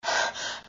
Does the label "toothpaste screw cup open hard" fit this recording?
No